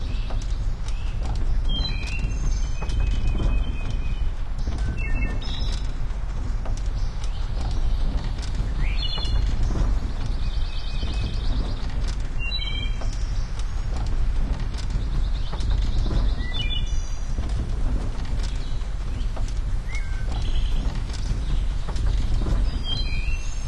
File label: amb windmill birds; monument valley